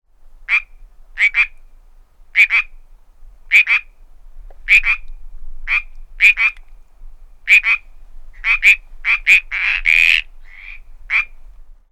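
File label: pcadaverina group, frogs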